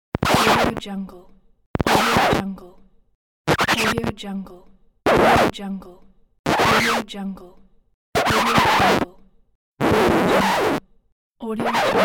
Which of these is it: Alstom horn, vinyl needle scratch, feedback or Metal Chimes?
vinyl needle scratch